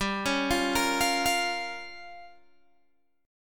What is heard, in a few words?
G Minor 7th Flat 5th, Guitar, Open G